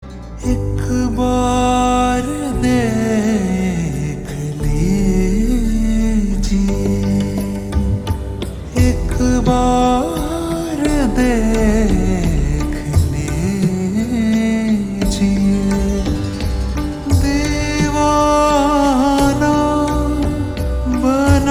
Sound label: Ringtone slowed reverb